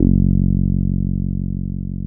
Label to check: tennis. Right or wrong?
Wrong